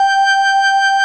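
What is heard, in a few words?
organ Vox G5, Continental, wah bar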